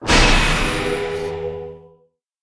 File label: enemy basic